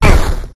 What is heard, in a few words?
8bit explosion medium with voice bomb boom blast cannon retro old school classic cartoon